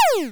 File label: laser